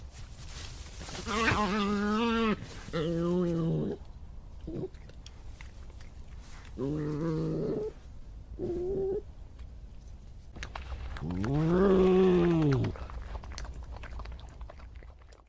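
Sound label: badger; barsuk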